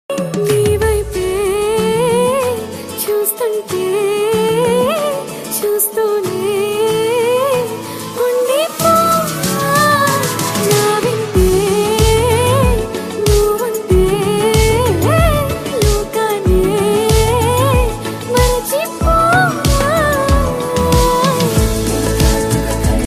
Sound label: love song